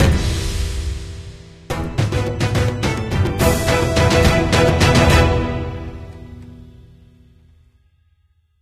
Stinger Win; Akt4 The Lich; runic rampage

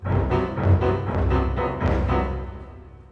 Drone

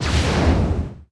Fire shell

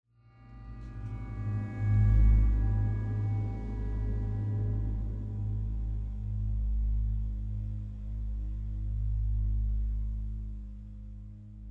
Dark Scary Explore Mood

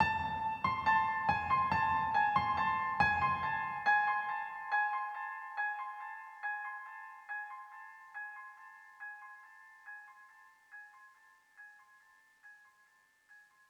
One shot Piano; zombie army 4 dead war; ps4 stadia switch windows xbox one

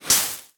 Heldbeat End Fuse

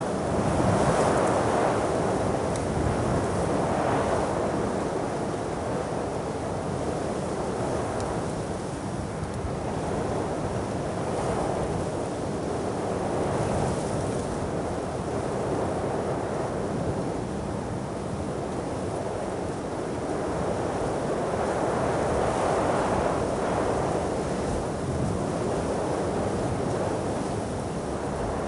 Grasslands Bed; Civilization V

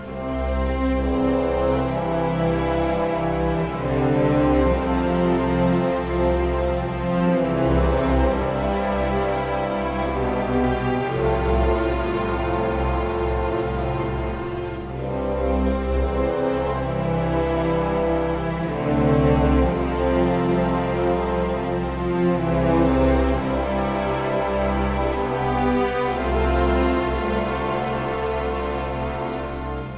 LOADING; brothers in arms earned in blood

